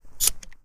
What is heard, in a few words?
Remington 870 Reload; zps; Weapons; Firearms